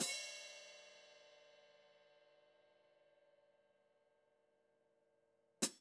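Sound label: Hats Pedal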